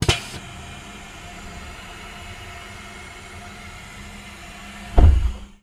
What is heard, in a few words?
close